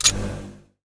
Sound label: Icon Hover; Artemis Apollo